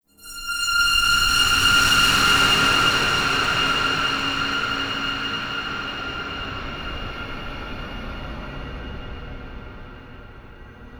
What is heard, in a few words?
Modular Texture; Ambience; Warframe; Shadowgrapher